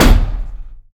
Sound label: Door Hit Kill; MiSide